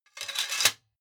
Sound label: sword sabre sheathe